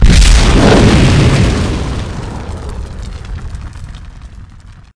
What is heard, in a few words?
Weapons Fireball shot